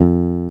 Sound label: music note; super mario paint